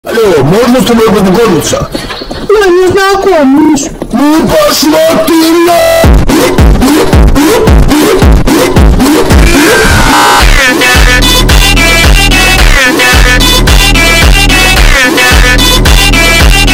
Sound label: Phonk FUNK